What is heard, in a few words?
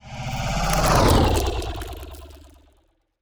pro ship weaver move; biogenesis